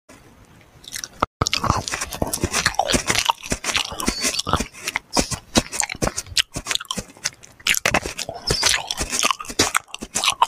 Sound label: eating bananas